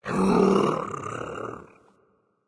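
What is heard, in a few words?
Movement Growl; smite; god bakasura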